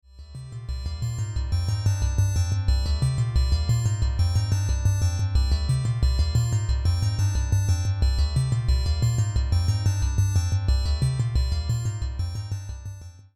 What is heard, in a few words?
Glassware